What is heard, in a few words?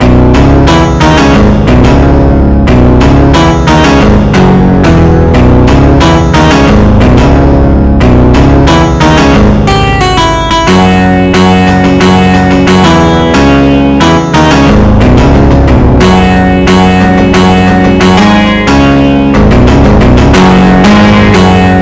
Guitar Solo